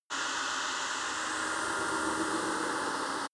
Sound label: windy dark shuttle